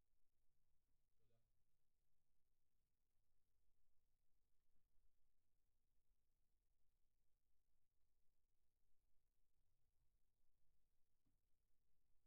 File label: Kick In, 94bpm